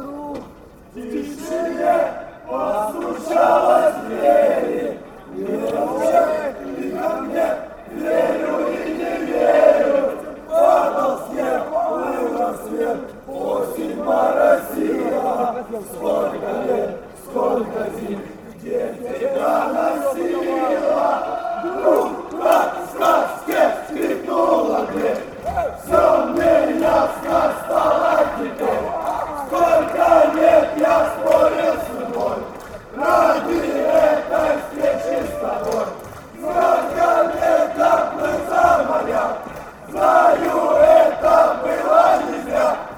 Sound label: chant, ukraine fanchants; metalist